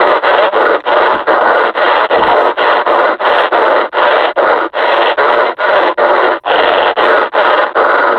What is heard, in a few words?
panting, suppressor